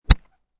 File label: Golf Ball Hit; Sports